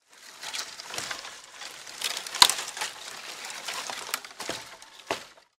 sobirajut vetki

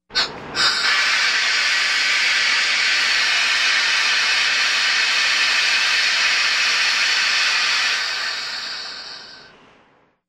parovaja otsechka variant; звук паровоза

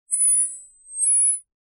jelektronnyj zvuk obratnoj svjazi mikrofona

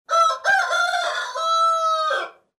petuh

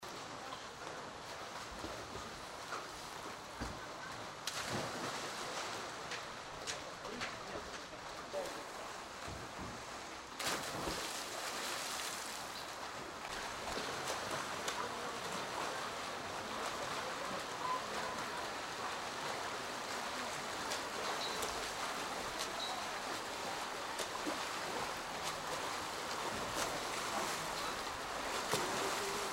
vodnoe polo plovtsy trenirivka

